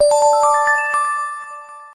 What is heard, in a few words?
Short Sms Tone